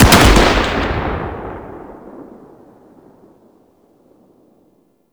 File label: Sniper Shot; Warframe; Tenno